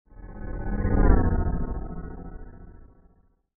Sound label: Hover Space Craft By; spaceship fx